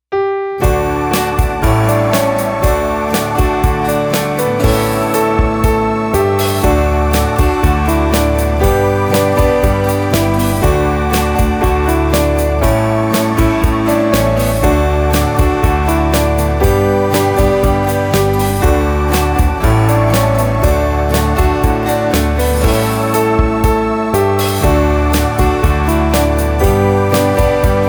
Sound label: Instrumental; Kids Liturgy; Year A